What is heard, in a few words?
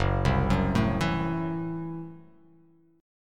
Gb Minor 7th Flat 5th, guitar chord; arpeggiated; JGuitar